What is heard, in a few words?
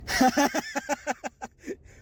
Laugh